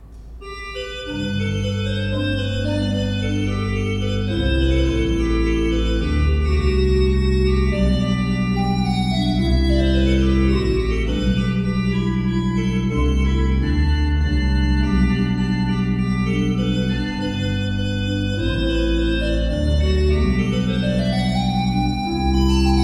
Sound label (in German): Bosch Raupach, gebrauchtorgel